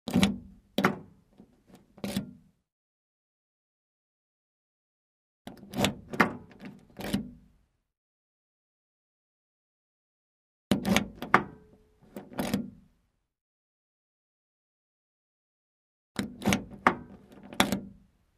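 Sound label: car door opening and closing with key inside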